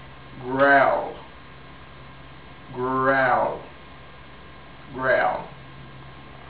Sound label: growle